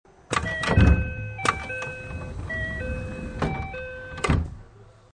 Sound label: door